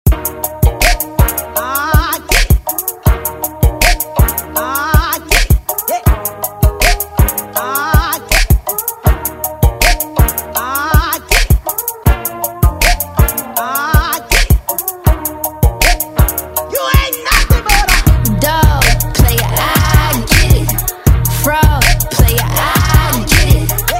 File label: Intro Outro; Redrum